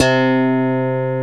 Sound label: GTR SYNTH, Clean E.Gtr; Roland LCDP02 Guitar and Bass; Hookin'Pick